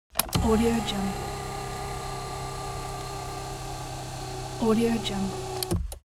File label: adjusting electric car seat